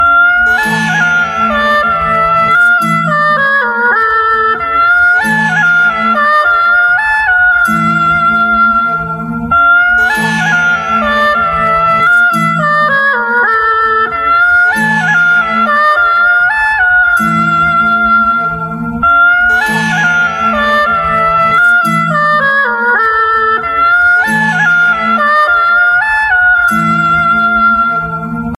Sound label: Arabian Snake Music